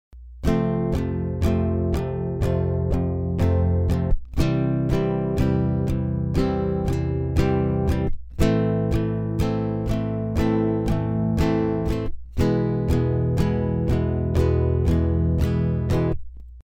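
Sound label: rhythm, Guitar 1 (basic strumming), F, C, Am, E; fingerstyle; Guitar 1 MP3 (basic strumming)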